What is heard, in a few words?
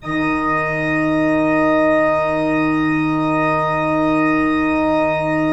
PIPE, 3DOrgans